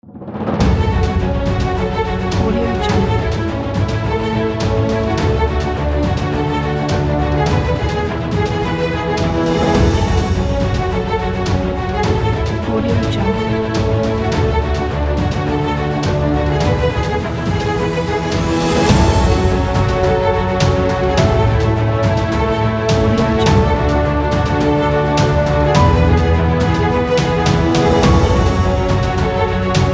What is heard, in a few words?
epicness; coyotemusicstudio